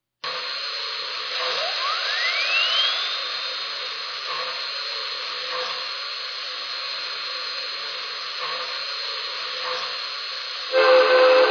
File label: hudson; l&n